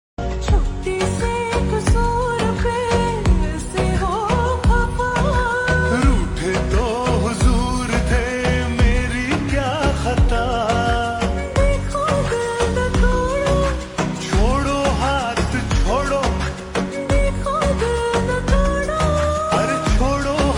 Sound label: Ringtone Slowed Reverb